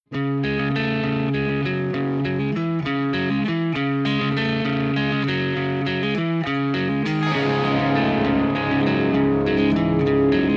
Instrumental

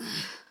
Breath; Lewd SFX

